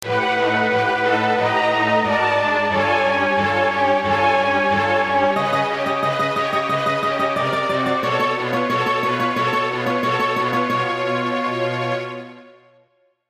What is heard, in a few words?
ringtone horror midi